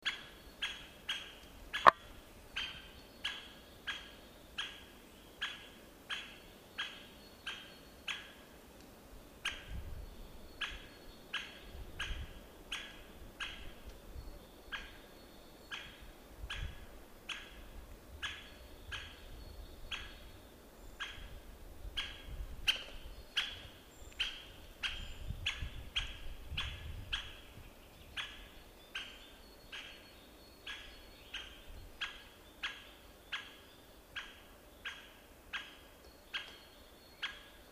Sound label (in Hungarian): D. leucotos kapcsolattartó hang; G.Gorman